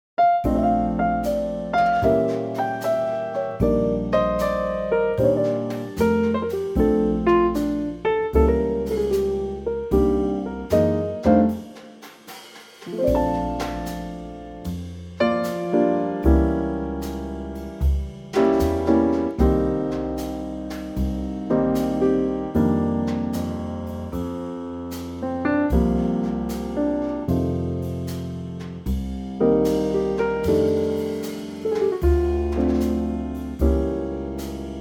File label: Bb, male; Trio; vocal range - Bb to D; Gorgeous old ballad